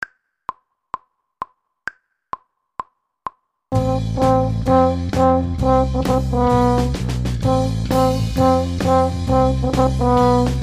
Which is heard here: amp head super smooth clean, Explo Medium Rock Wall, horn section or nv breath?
horn section